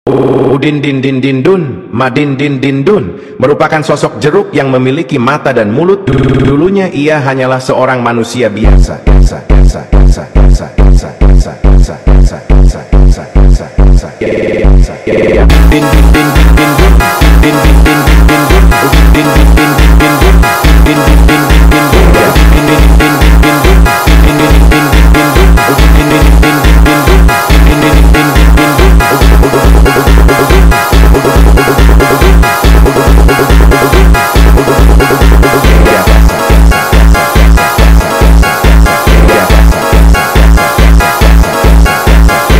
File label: funk